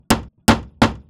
hammer nails wood; spike

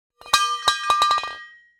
metal drop concrete

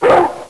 BIG BARK, dog bark

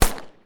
9 mm single shot

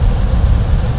tire road roll; street

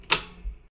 door open

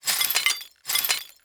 sweeping glass; shards

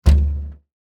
barrel hit, cask

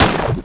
GOO GUN

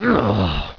GRUNT, male grunt

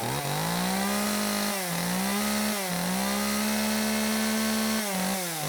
Chainssaw cut, chainsaw cutting

